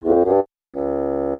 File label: Upset Melodic; emotes